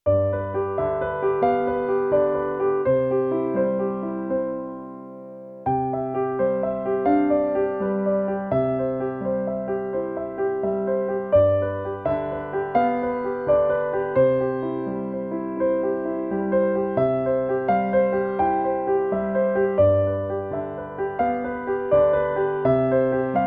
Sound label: Summ summ summ in C